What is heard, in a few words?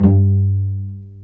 STR VC, Vcs Pz dry; STR Vcs Marc-Piz